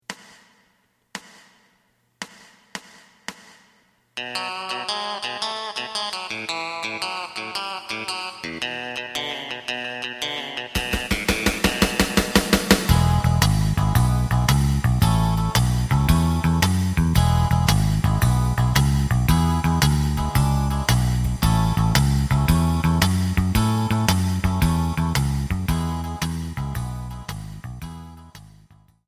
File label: lead